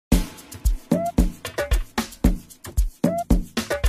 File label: Amapiano Meets Gospel